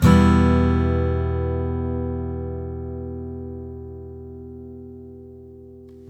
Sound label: MIN E, Blues Guitar; ILIO; Fretworks